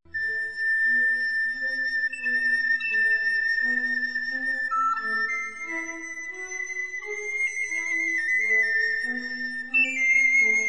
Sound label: piccolo; western